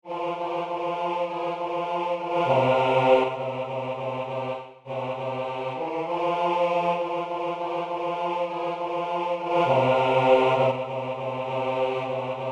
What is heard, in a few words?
Bass; Conforto; Oper